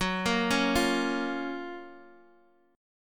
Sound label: Gb Major 7th, Guitar DADGAD; chordshape; down